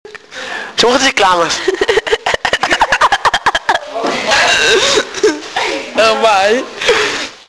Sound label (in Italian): risata; fvg; studenti 3a paularo